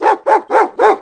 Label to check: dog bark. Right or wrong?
Right